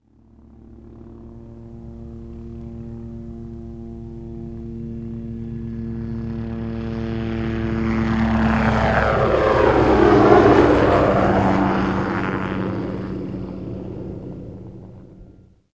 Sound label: loach; firearms; thanatos